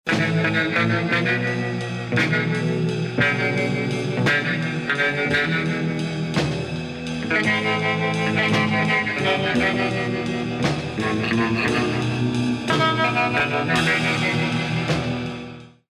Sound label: Slowly loop